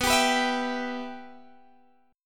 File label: B Suspended 4th Sharp 5th, Guitar, Standard; Bsus4#5 strummed; JGuitar